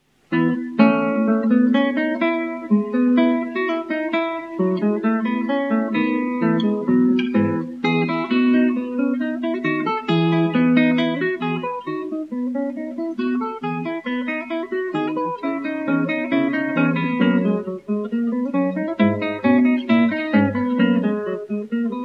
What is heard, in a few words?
Bourrée